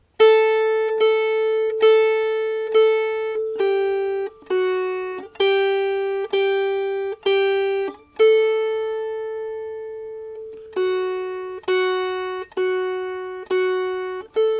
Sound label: Melody; Cryderville Jail